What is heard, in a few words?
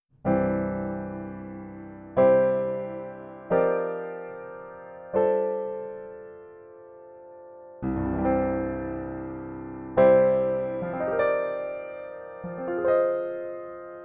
Yamaha Grand B2